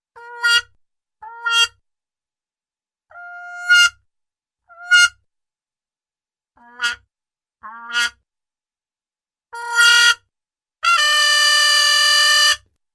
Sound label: Muted Trumpet Short Double Blasts Short Single Blasts; Hollywood Edge Cartoon Trax